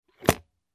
Suitcase opening

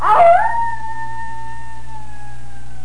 Wolf pup howling

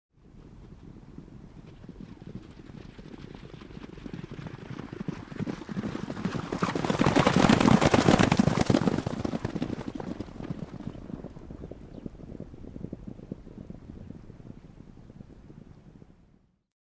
Horses passing by

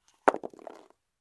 Dice roll on board

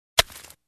Smashing gravel with a pickaxe

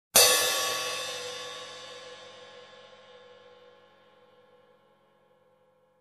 Close Ride Hit

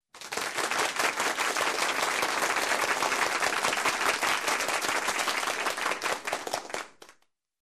Group Warm of Applause

